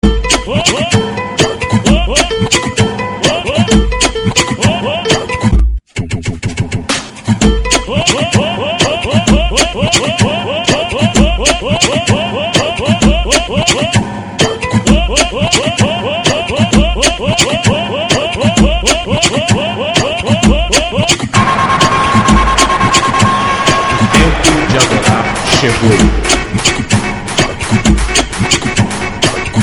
funk

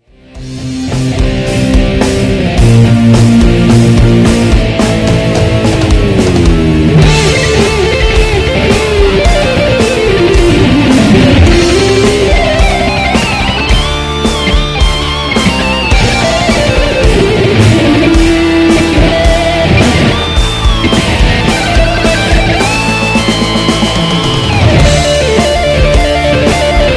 solo

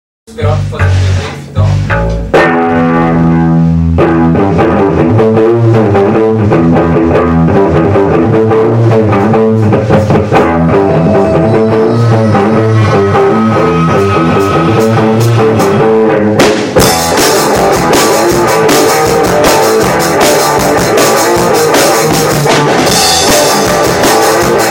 creepy riff

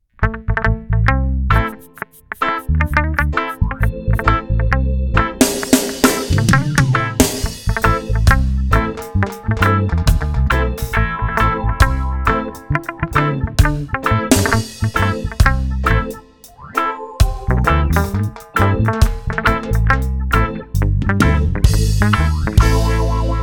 reggae

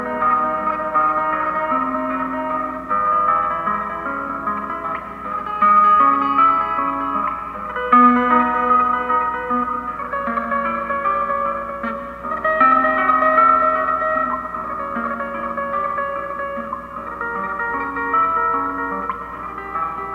tremolo

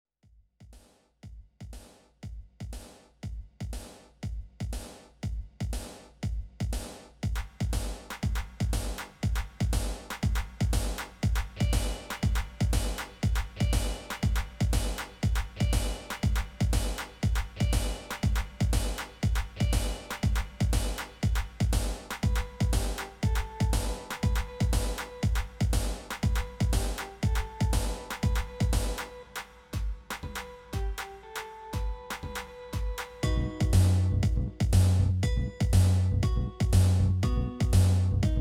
latin house

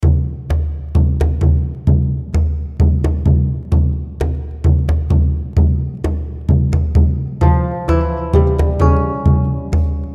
final dance instrumental

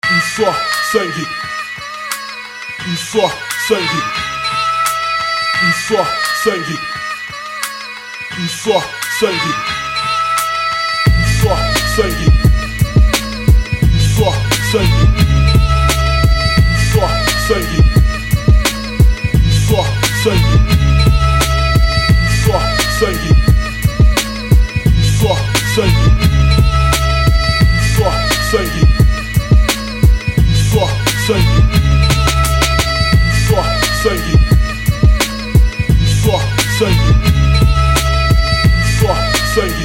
beat

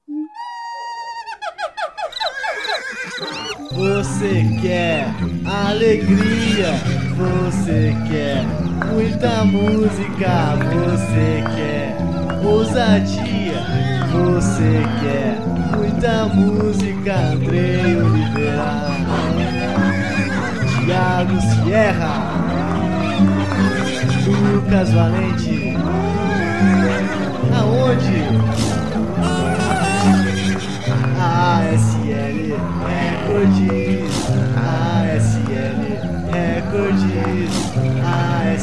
jingle; asl records

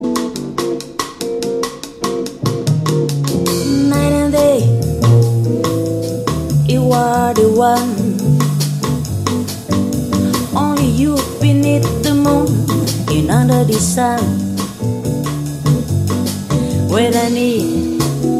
jazz

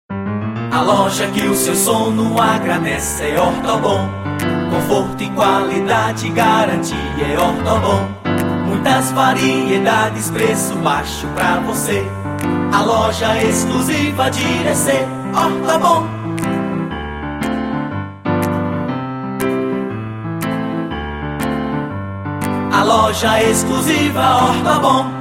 jingle; ortobom

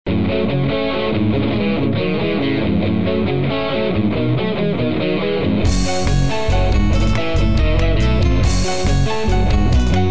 magic sonata instrumental

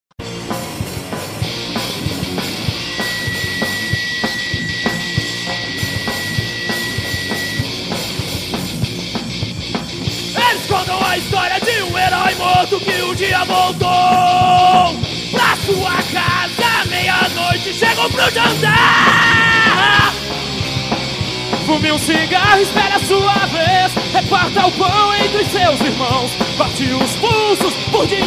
cellos

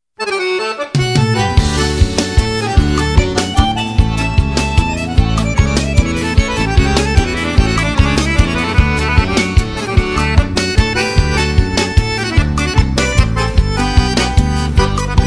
instrumental

